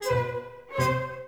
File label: Loss